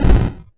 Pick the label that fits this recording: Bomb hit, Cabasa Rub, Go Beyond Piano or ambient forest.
Bomb hit